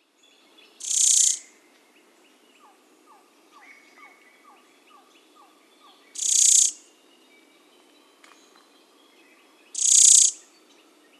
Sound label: Crescent chested Warbler